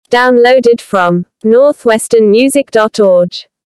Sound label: Jingle; logos